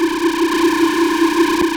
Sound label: laser loop; danger sides